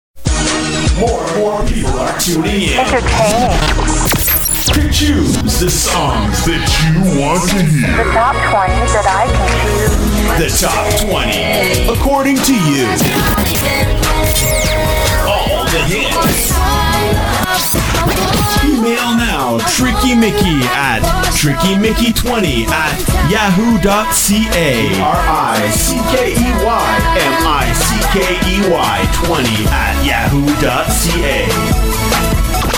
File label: Beat Mix